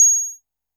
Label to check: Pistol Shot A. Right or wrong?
Wrong